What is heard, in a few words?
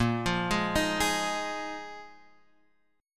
A sharp 7th Suspended 4th down Guitar Standard; arpeggiated; JGuitar